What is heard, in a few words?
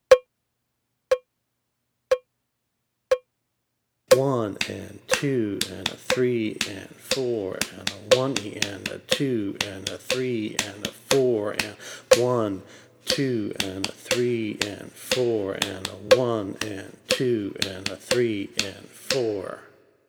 eighth sixteenth combo